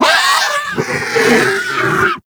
Snow Critter Vocal Death; Hunting Critters

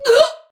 Death; Warframe; Tau; Dax; Ninja

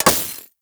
Laser Impact Glass Large; Warframe; Bullet Impacts

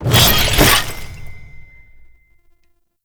Customization UI Equip; Veilbreaker; Kahl

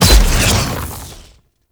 Bow Arrow Rain Explosion F; Ultimate Abilities; Madurai; Warframe; Focus

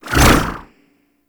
Melee Long Whoosh

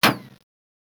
Weapon Hit Effect Metal; Warframe; Caliber Chicks Minigame; Weapons; Hit Effects

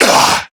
Pain, Male

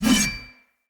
Sword Whoosh Heavy; Weapons; Swords; Fenn Jotar Sword

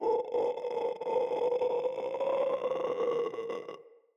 Infested Runner Patrol

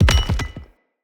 Bomb Drop; Encounters; Ode Pollution Tower

